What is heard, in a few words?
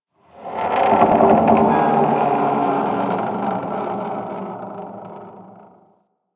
Exterior Metal Debris Creaks; Props; Warframe; Railjack